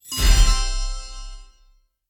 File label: hit generic